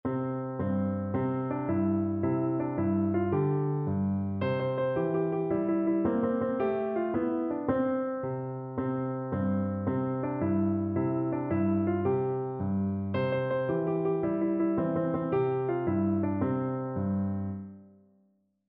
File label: row row row, piano